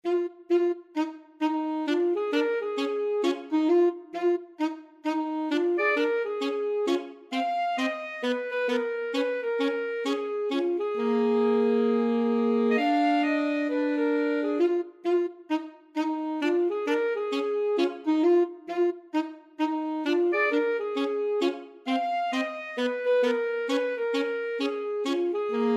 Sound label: Alto Saxophone duet